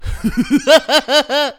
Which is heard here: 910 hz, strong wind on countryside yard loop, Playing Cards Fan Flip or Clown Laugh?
Clown Laugh